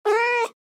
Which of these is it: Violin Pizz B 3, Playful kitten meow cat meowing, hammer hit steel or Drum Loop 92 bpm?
Playful kitten meow cat meowing